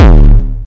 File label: hardcore verb, Kicks; single hits